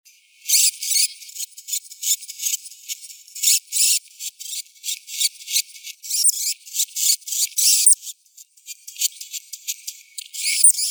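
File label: common hamster, Cricetus cricetus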